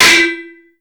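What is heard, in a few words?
bullet impact metal target; Modern Warfare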